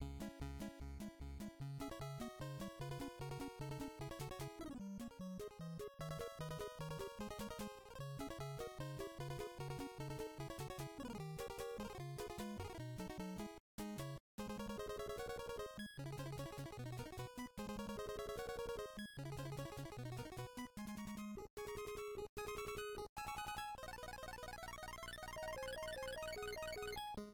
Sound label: mus main fast; tetris